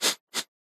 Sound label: smell